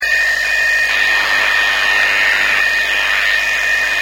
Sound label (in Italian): audio disturbato, dcf77